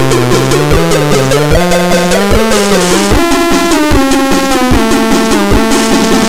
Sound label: Roar; Miggy